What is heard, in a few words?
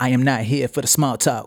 Lead Vocal C m